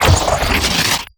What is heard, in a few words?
Teleporter Warp Blast Explosion; Evolve; Wraith